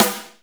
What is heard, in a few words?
Snare, Akai MPC-500 Drum Machines; Toolkits; Snare TKit